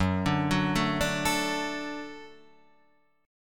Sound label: Gb Minor Sharp 5th Gb; arpeggiated; JGuitar